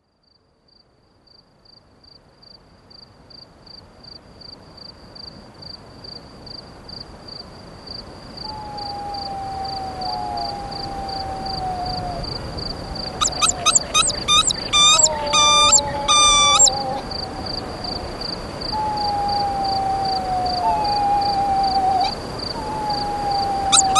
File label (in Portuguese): Freira Bugio Desertas